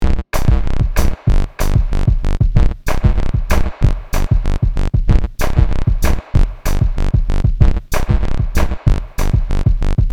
Chiptune noise and drums loop; gfxsounds

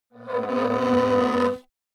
Rubber tube creaking and squeaking

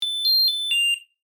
Appliance power on jingle startup; Home and Household

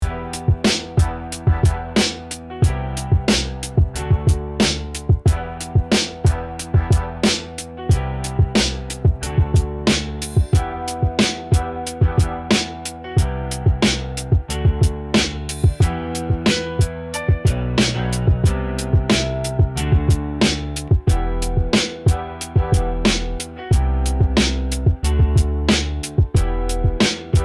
Rap beat

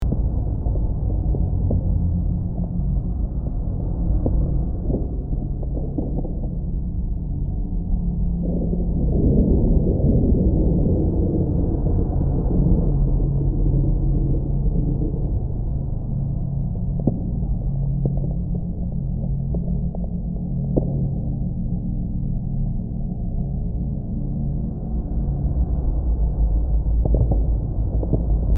Small asteroids cracks and rumbles in space

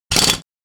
Impact wrench single burst

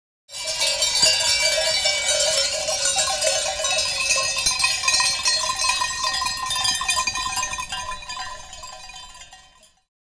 Field ambience village sheep bells

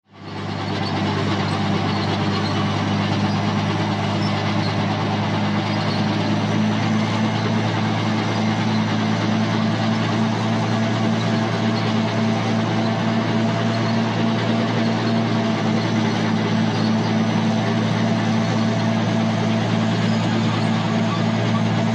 War vehicle tank moving mechanical noise

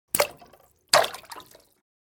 Pooping poop defecation ploppy drop

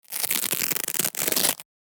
Velcro small patch tearing

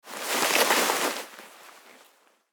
Fabric material cloth shuffling

